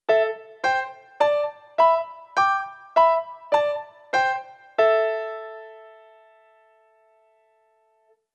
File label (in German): CHINESISCHE QUINTEN a e trp